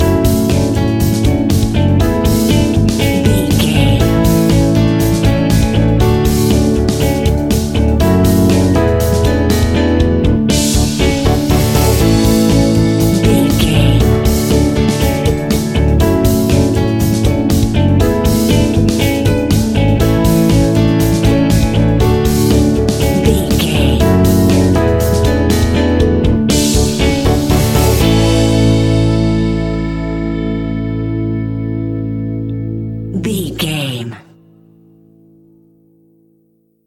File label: indie garage pop rock; Garage Pop Rock 30 Sec; Catch 22 Music Network